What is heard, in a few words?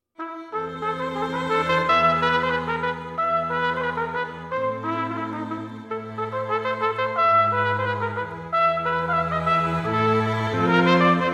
B Minor Etude, trumpet